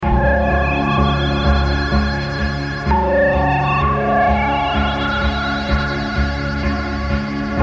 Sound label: ringtone